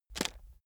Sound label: chocolates take short